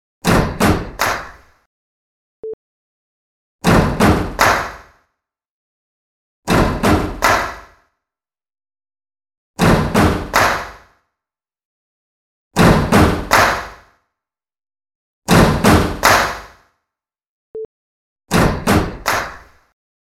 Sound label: Before After; Juskiddink